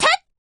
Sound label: kick, "jp"; p4u; yukari